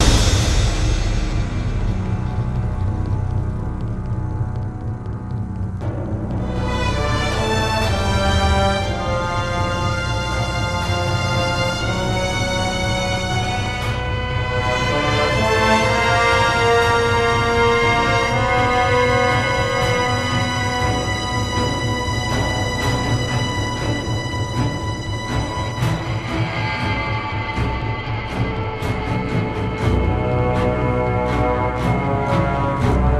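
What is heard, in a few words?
full loop; transformers war for cybertron gamerip; MATRIX OF LEAD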